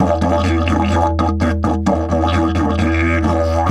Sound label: E 130, didgeridoo; Big Fish Audio - Didgeridoo and Other Primitive Instruments; 130BPM